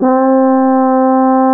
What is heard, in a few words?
sc88pro trumpet; tumbledemerald